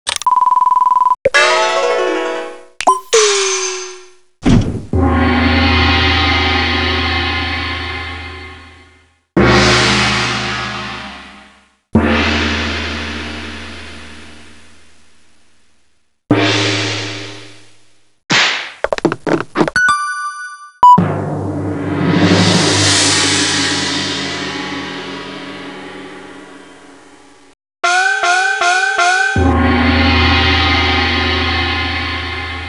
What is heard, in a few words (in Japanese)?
効果音 45連発; Mah-Jong Fight Club